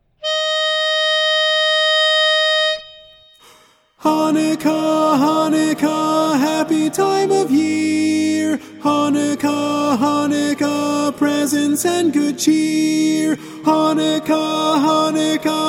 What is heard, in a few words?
Chanukah, Chanukah Tenor mono; Christmas